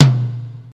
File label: menu back